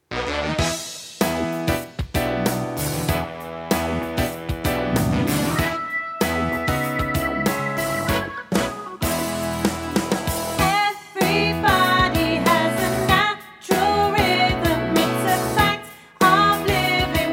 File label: Guide Vocal Track Verse